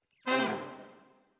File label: Sax